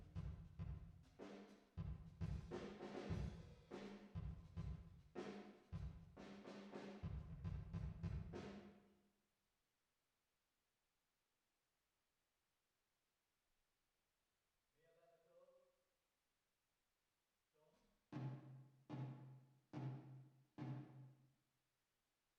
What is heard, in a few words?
DRUM VERB